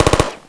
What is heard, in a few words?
shout